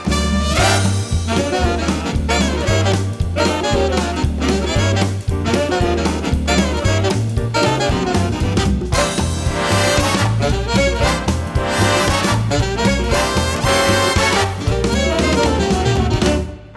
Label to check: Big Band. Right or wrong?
Right